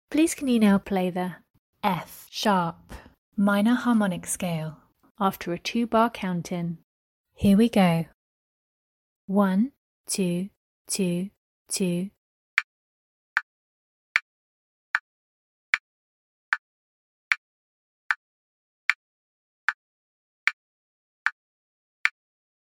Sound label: F sharp minor harmonic